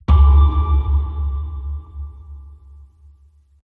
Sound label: pow